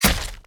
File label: ultimate attack impact; minsc